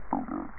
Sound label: burps